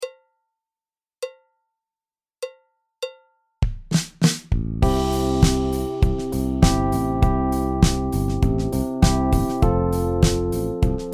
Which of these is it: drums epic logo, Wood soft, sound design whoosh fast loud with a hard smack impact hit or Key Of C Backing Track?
Key Of C Backing Track